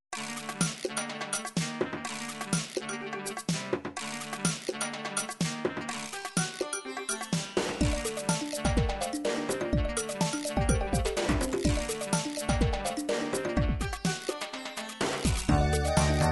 dance techno ethno, midi

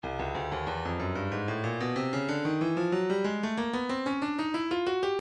breakbeat midi files 092 093 bpm; float sting a; Stylus Breakbeat Midi Files

